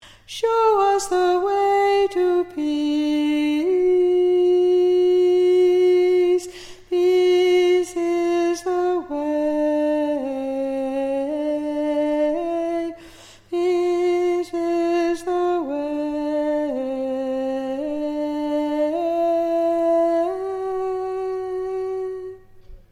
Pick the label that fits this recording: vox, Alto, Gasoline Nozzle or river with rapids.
Alto